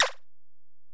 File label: EPerc, Alesis DM-PRO